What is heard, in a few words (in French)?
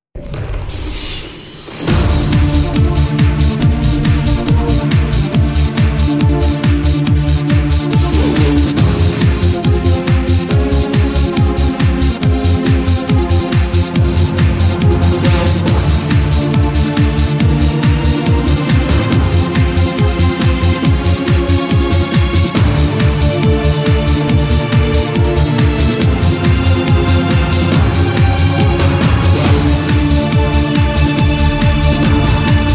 jingle